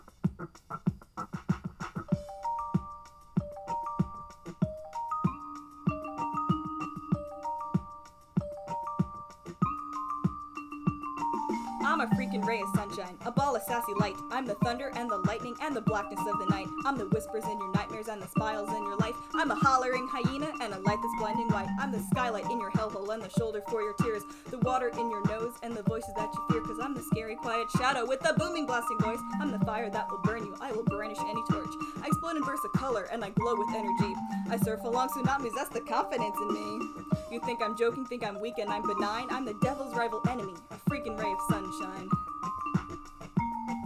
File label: Sunshine vocals track clear